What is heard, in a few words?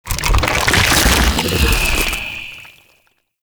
Vendor Emerge; Warframe; Infested Eris